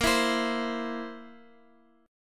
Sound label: Bb Suspended 2nd Flat 5th, Guitar; strummed